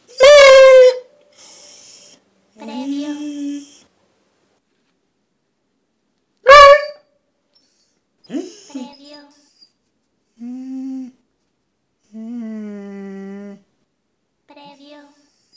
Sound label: Dog Yelping Whining